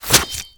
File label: Sword Scale, Damage